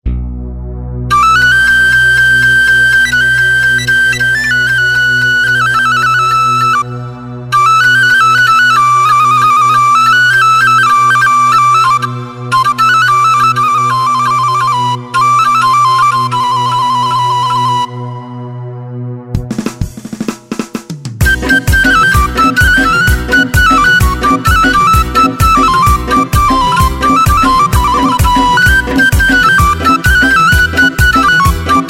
flut

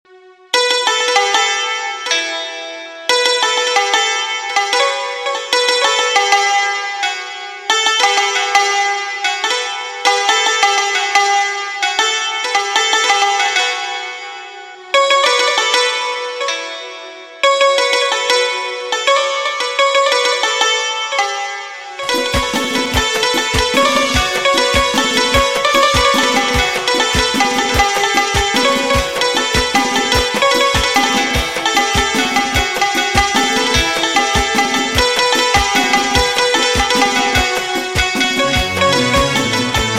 Santoor irani; انواع صدا برای ارگ

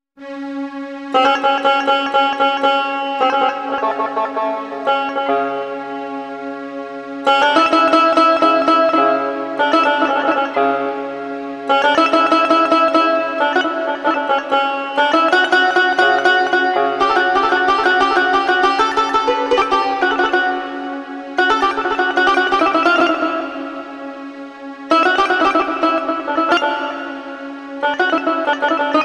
tar azari; انواع صدا برای ارگ

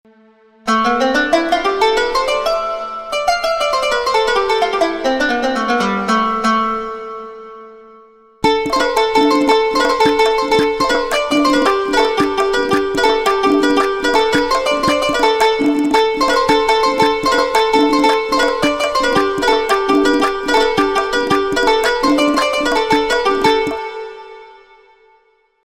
kanoon; انواع صدا برای ارگ

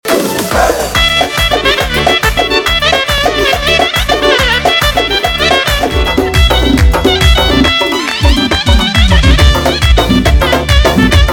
zurna

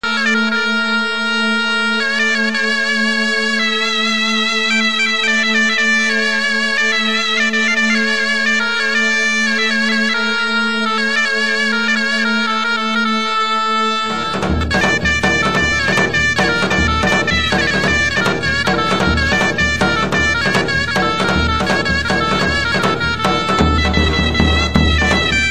zurna arabesk

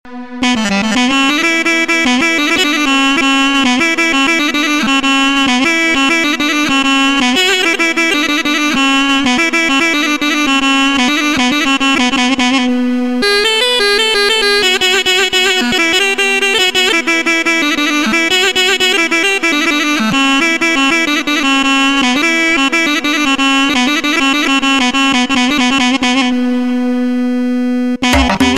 balaban

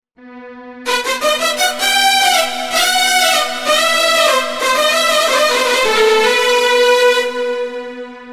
string koronos

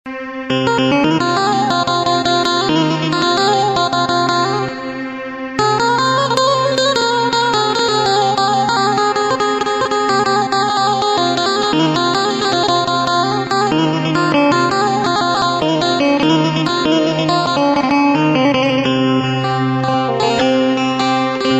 electro saji; انواع صدا برای ارگ

